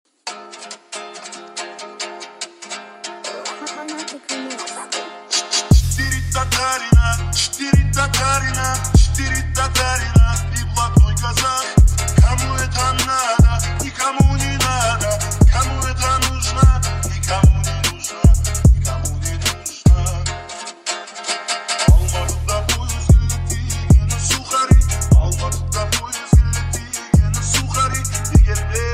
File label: Tik Tok Remix bass